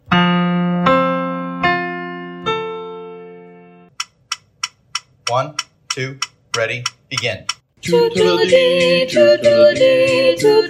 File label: tenor; tutto lo di